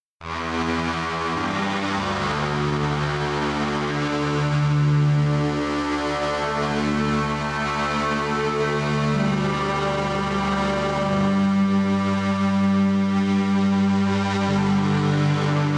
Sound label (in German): instrument modus vocoder strings